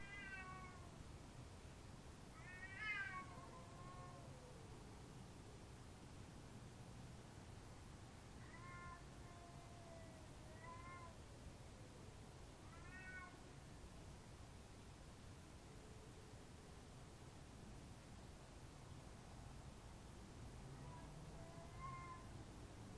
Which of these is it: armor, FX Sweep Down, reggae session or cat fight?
cat fight